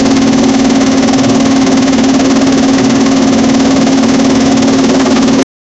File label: magical steam engine cran, steampunk